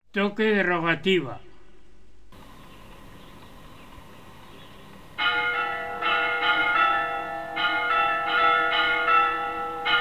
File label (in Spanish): Toque de Rogativa, Campanas; Toques de Campana en Sangüesa